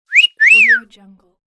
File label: female wolf whistle